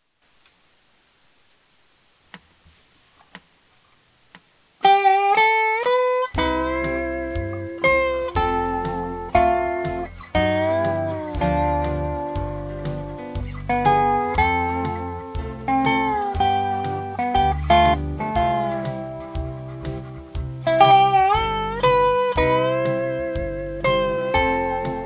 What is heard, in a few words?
slow, dobro